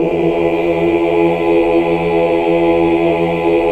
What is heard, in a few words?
OOH, Hallelujah